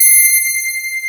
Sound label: muted trump C6; Star Trek JR Audio